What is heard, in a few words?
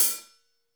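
HH HH, Roland, hi-hat; 90 s Sample CDs; Sound & Vision - Gigapack I CD 1 (Roland)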